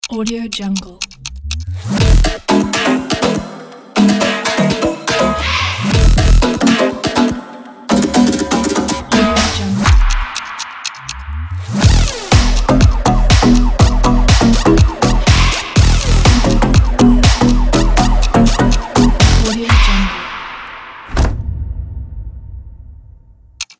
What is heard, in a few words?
fashion opener kit